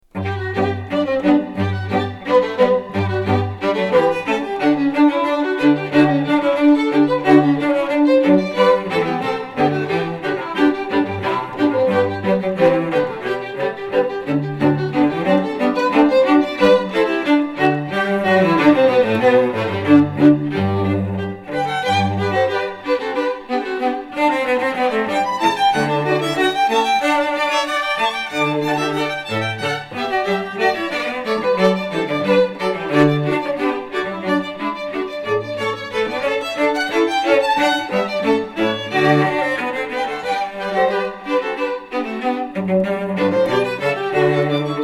Brandenburg Concerto, String Quartet; Classical; Maywood String Quartet

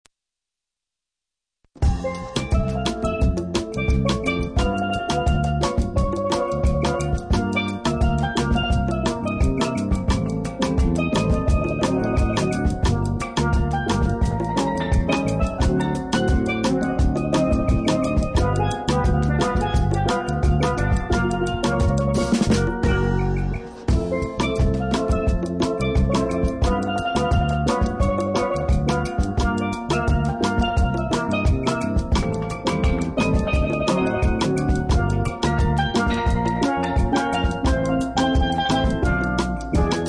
Acoustic Steel Drum Band; caribbean

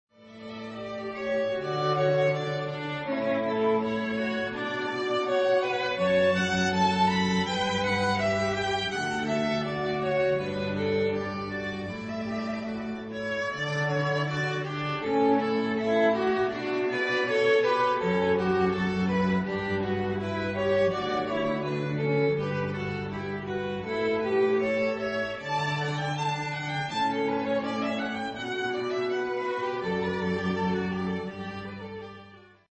String Trio

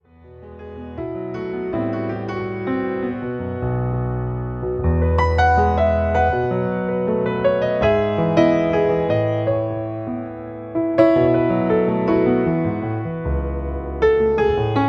New Age Band

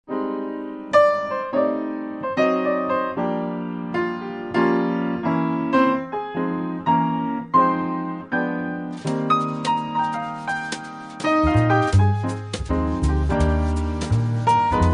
trio, piano

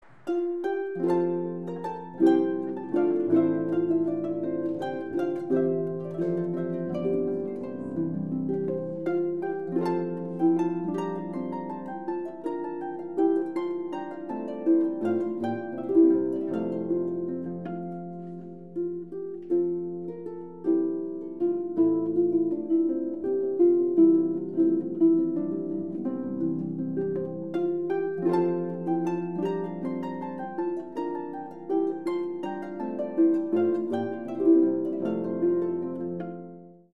Courante, harp

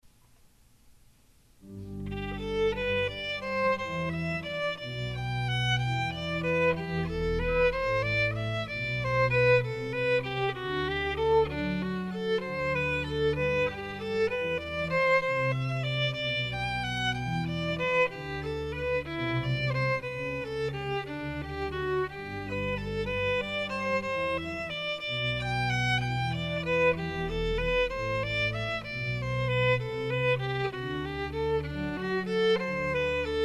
Violin and organ; Jesu Joy of Mans desiring; by Bach; David Dyson

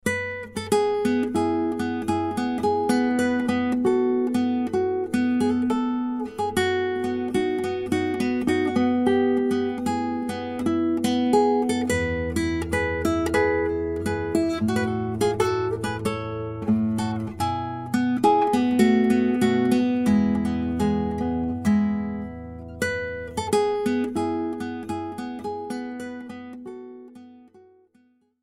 Classical Guitar

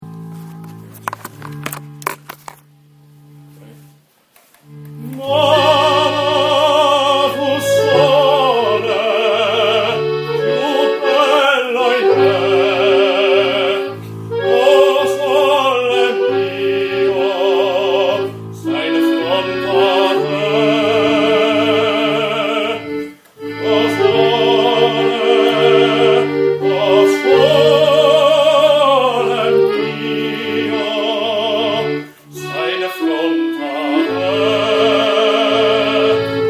Vocal Version, accordion; O Sole Mio

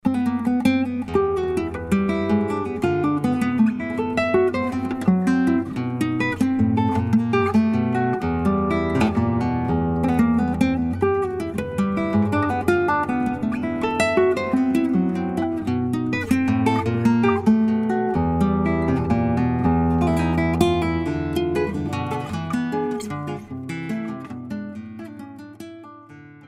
venezolano, Classical Guitar

